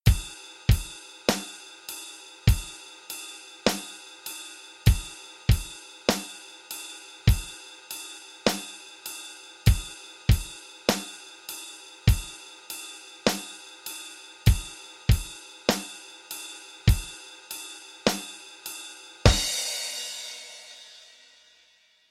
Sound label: rock basic, rock basic rhythmen